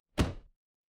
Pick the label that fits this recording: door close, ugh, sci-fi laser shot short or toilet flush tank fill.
door close